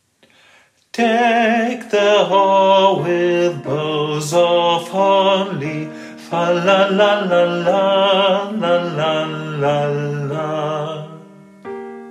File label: Deck the halls Sopran; Popchor